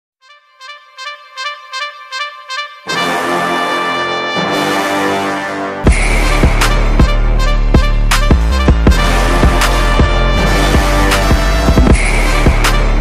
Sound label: theme music bgm movie bgm ringtone; sarpatta parambarai